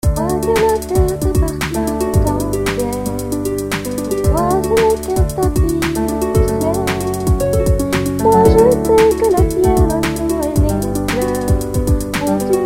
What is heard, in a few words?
R&B; Projet Songsmith